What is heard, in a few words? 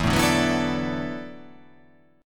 E 7th Suspended 2nd Suspended 4th, down